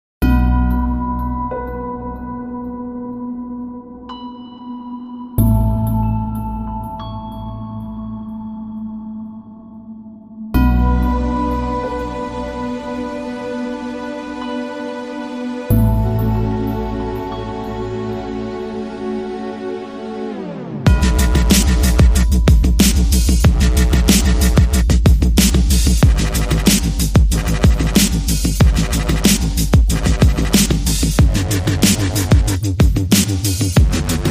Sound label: Dramatic String